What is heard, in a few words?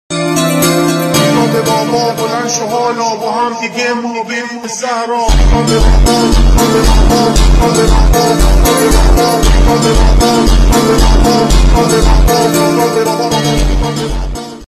FUNK; irani funk